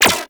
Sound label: Shield Impact Default; Volt